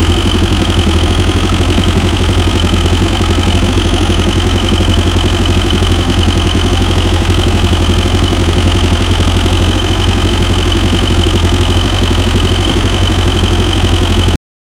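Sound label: sound of a 30 litre inline, diesel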